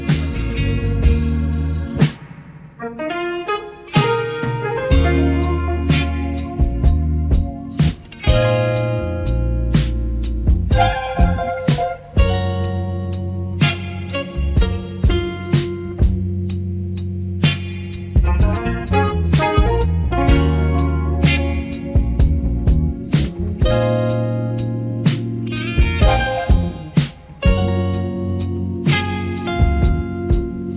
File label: BGM; RandomGFGoStop